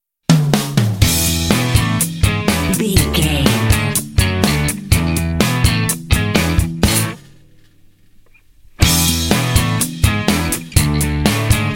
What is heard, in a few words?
indie pop jams all percussion